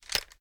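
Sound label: pistol drop; reloads; gmod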